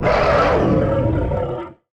monster

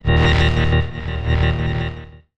chimaira

